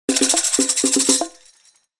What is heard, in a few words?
percussion